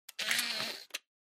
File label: Camera Compact Turn On